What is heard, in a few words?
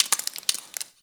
Ice Thaw Drip; Ambience; Warframe